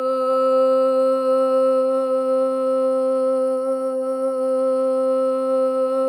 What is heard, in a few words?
SOLO BOY, OH; Spectrasonics; Symphony of Voices